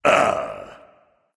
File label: "ah", discover; smite; voicepack; ullr